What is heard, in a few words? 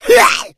smoker attack, voice; Left 4 Dead 2